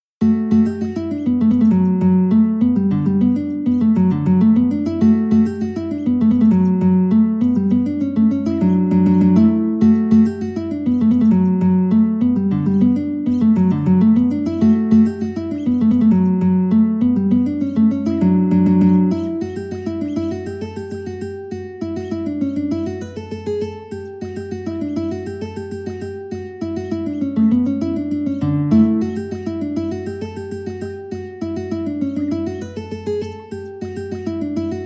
st annes reel guitar

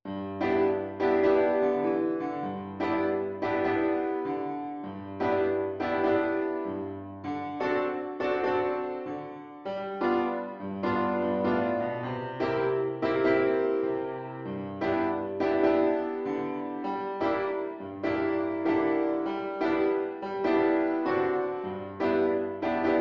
tohora nui violin

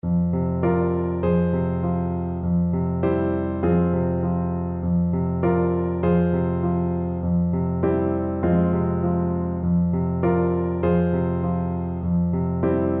clarinet

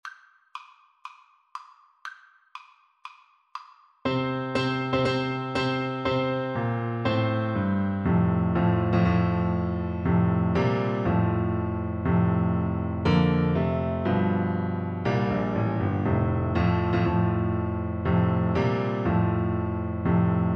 bassoon

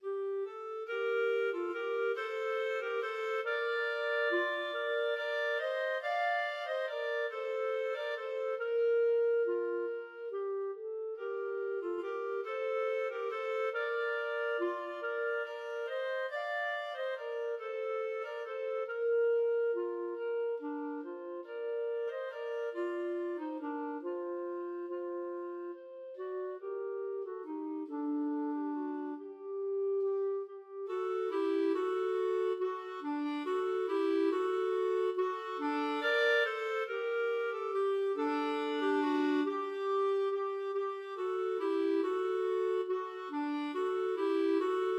clarinet duet; respighi

